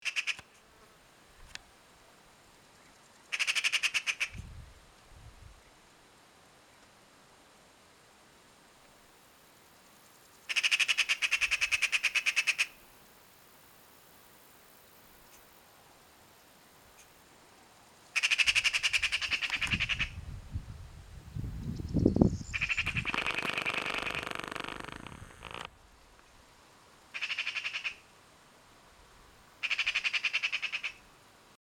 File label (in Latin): Pica pica; SETE